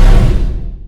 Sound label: projectile impact; Garry's Mod; popp5 lily love